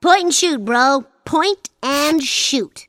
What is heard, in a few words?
death; openffa; bart